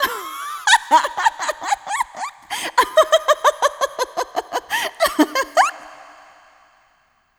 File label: laugh